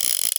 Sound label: gun cylinder spin